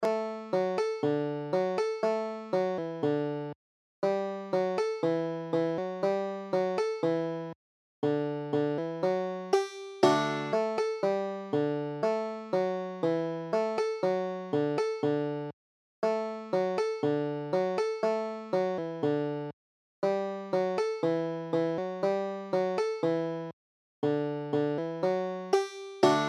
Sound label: cuckoos nest, banjo